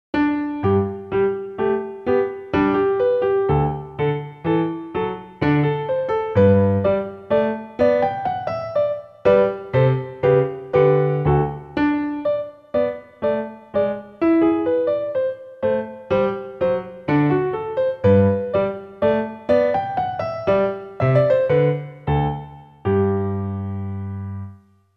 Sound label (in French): De Bonne Humeur Sol majeur, piano; Haydn